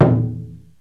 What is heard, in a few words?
KODAIKO; Crypton - DrumBase5000; JAPANESE PER